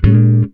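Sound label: guitar chord; Univers Sons; Jazzistic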